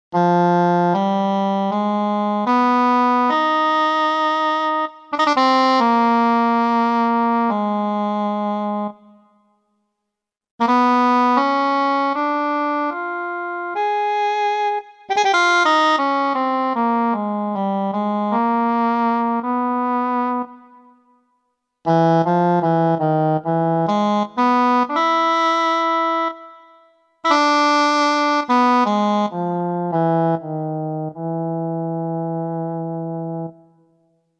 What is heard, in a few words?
English Horn, Korg microX